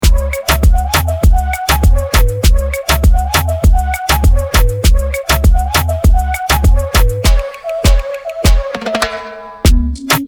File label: Instrumental; BodyJam 89